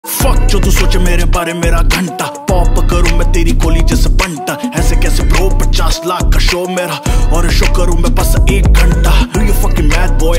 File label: hindi rap ringtone